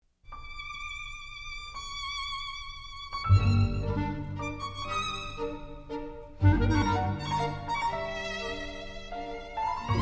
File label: waltz